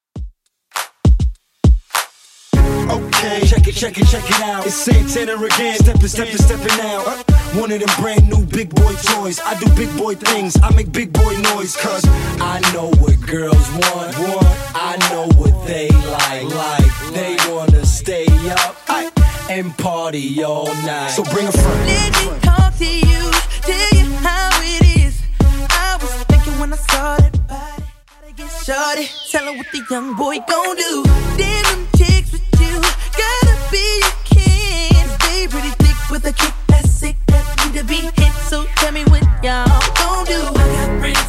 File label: Hiphop Redrum 101bpm